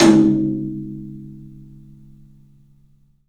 TOM, Low Toms, 3D Percussives

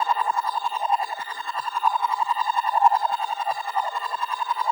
TRIPLE SWEEP; Spectrasonic Distorted Reality 1

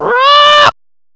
COCKY, ANIMALS; M8; CMI Factory

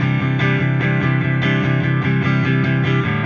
Silos Clean Strum Guitar, Indie Rock

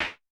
CLAP, Vermona Mono15 Drums

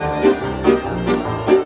VIOLA GROOVE; Best Service; World Colours

